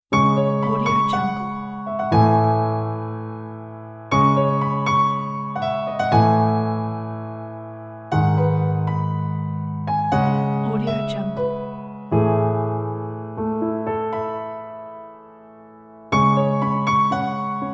reflective solo piano

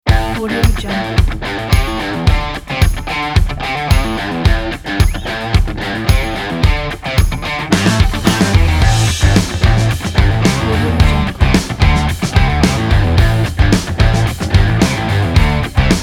fun dynamic driving rock

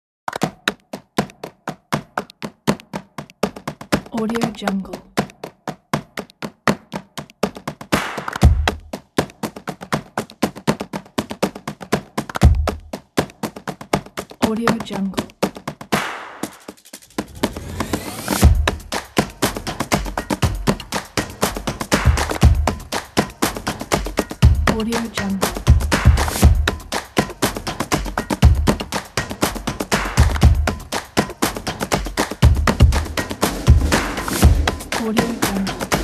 energetic and uplifting percussion and claps

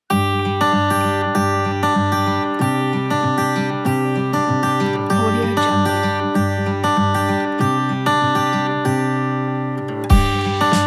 after the rain acoustic guitar

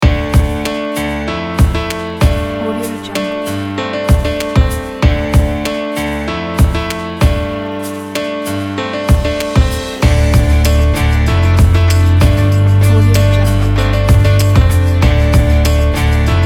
energetic

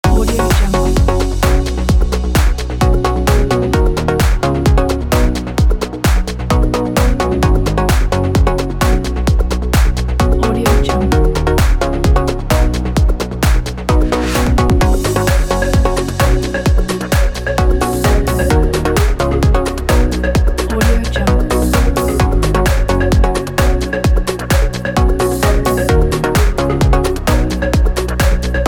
trance travel kit

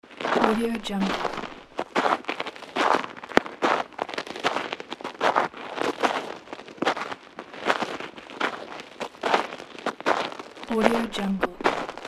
snow steps

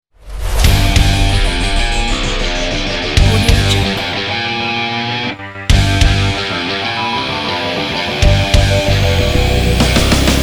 epic action sport heavy rock

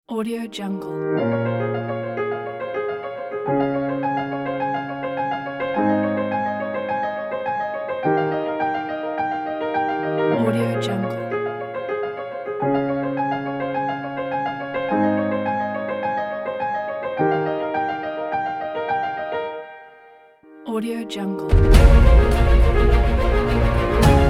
inspiring epic

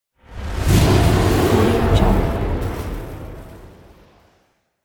ufo technology; infinity sfx productions

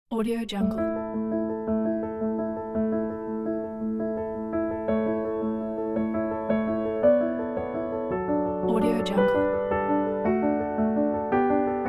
motivational piano song in triplets

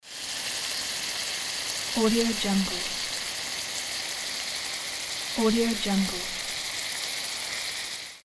cooking sound; lazyboysproductions

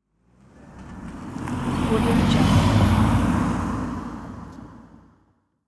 car passing by

